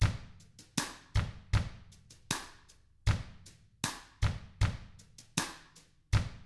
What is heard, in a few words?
COUNTRY, Drums; ILIO; Double Platinum Drums 1